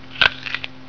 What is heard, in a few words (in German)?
Biss